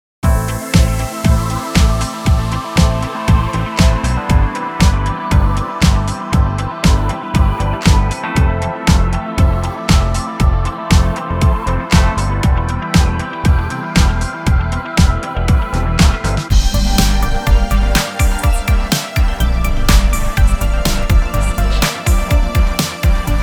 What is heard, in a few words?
80s Retro Synth Pop